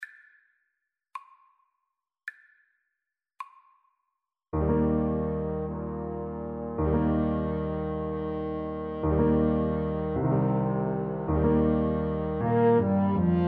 grieg morning 2HN, French Horn Duet

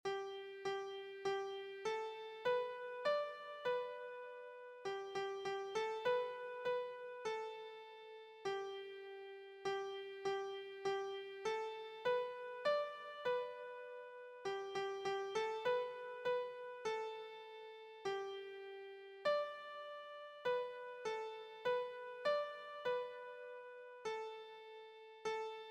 blood of jesus, keyboard

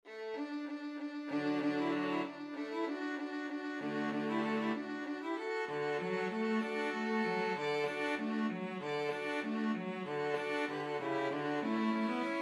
string quartet

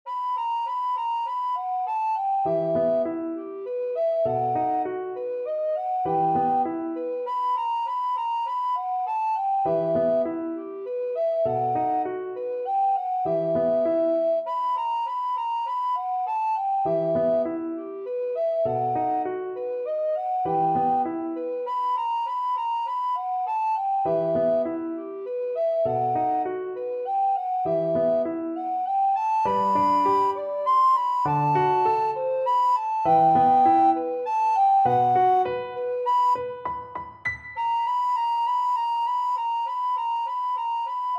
beethoven fur elise, alto recorder

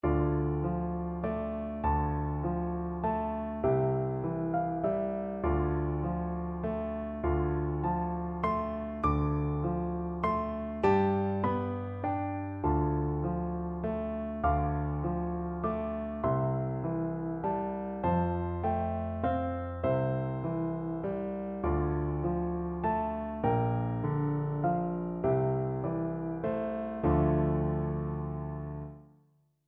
scarborough fair, piano duet

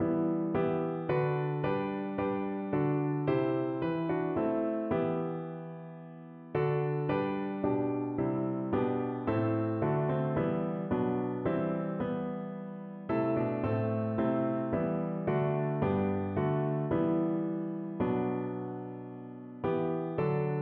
piano

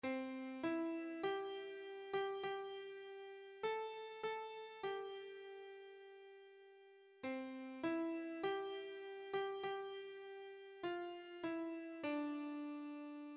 Kum Ba Yah, keyboard

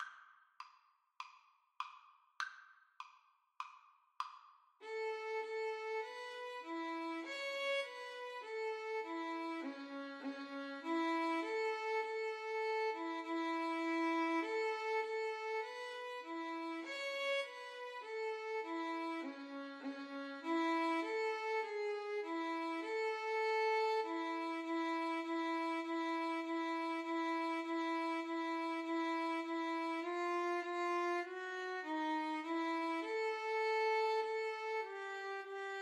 violin duet

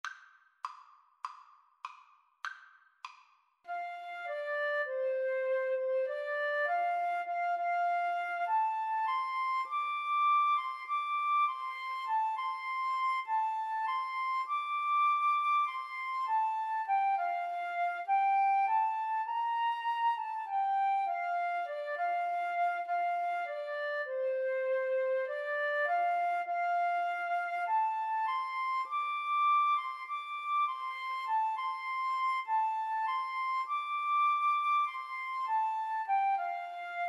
Flute duet

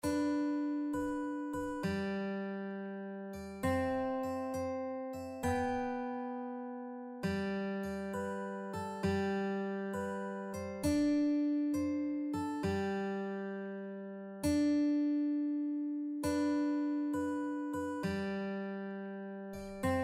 o holy night, mandolin

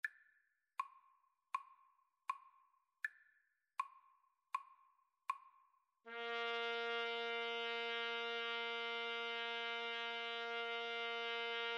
ave maria, Trumpet Duet

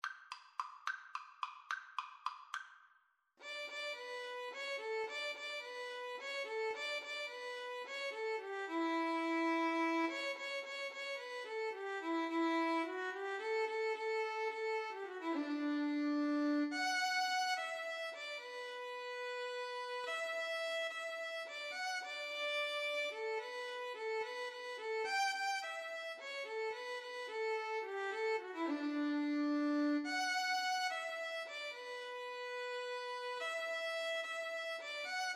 cielito lindo, Violin-Cello Duet

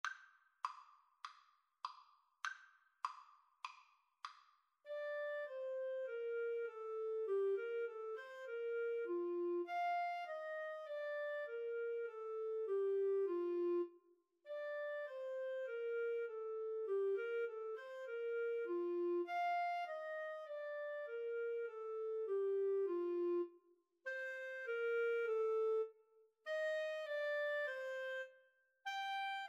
melody, clarinet saxophone duet

